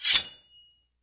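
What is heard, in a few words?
short blade out; The Elder Scrolls III - Morrowind; Morrowind GOTY GOG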